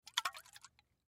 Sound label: Beer Can Move; Foley; SoundEffects-Blastwave